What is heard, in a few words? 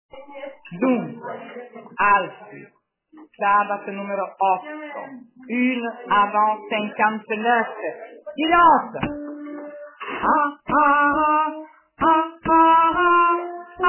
Stabat Alto; Mesure 58